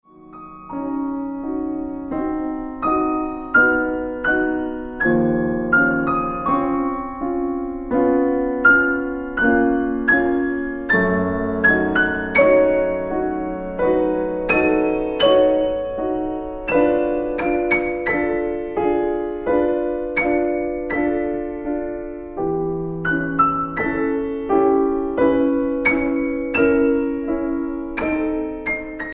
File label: Preludes for piano Lento